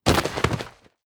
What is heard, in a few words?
Impact Fall; Deathcam